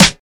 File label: Rich Top End Acoustic Snare Sample E Key, steel snare drum